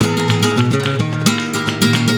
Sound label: GUIT, Gipsy